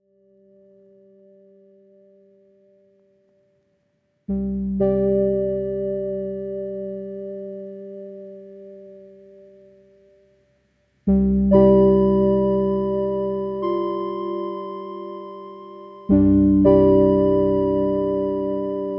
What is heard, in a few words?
Underwater Exploration; Godmode